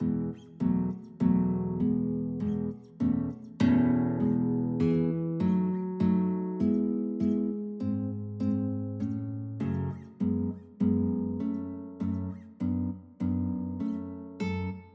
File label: guitar duet